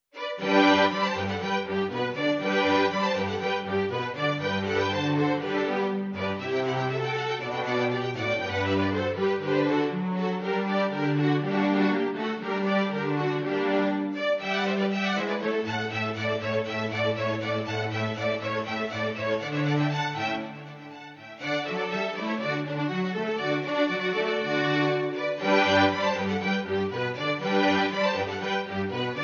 Sinfonia in G Major; Barbella

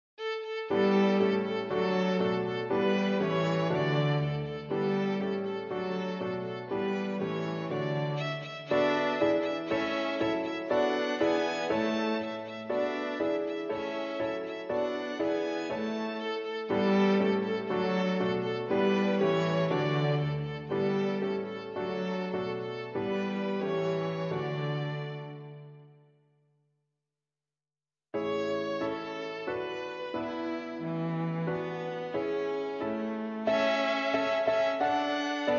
Piano Trio

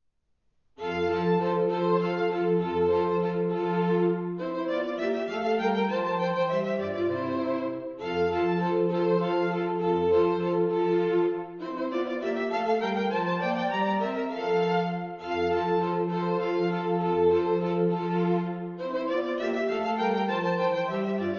Miniature Trio in F Major

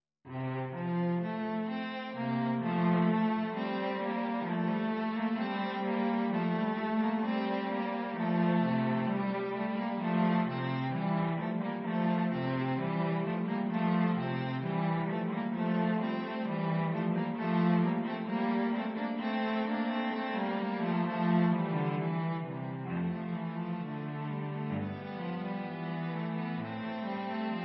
6 Cello Duets

